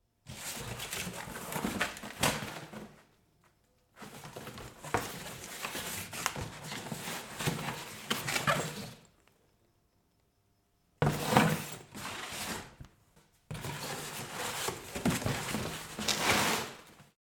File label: Cardboard Box